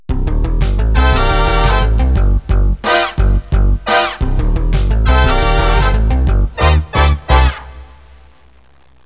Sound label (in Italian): musica newage